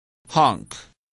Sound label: honk